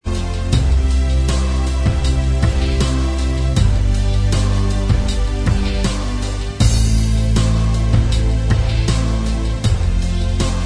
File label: Inst Track